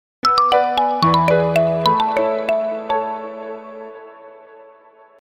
nokia marimba

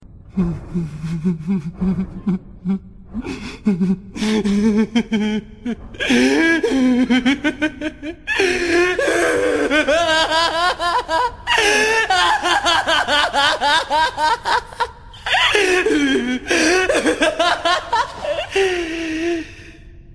death note laugh

